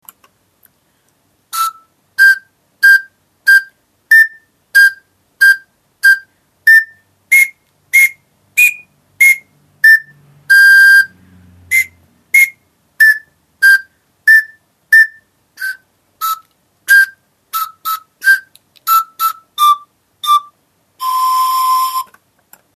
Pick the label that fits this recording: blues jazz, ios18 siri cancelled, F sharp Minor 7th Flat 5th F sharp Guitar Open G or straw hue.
straw hue